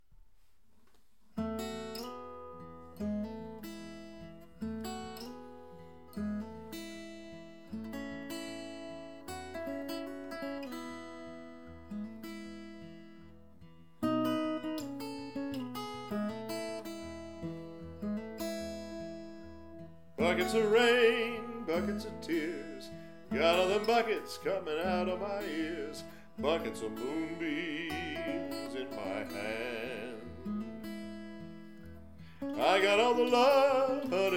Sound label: guitar